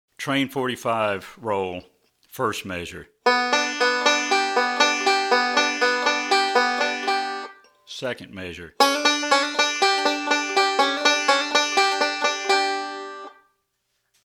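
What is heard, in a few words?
Train 45 Roll, banjo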